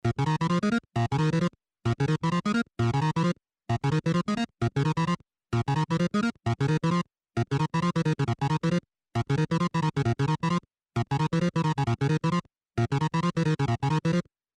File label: Game Ultrafast Another Jump Loop; 8 bit - Gaming - Schnell